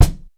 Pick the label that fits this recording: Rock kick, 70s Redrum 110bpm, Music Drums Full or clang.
Rock kick